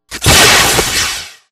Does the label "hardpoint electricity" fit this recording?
Yes